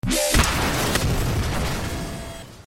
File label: electrorifle gunshot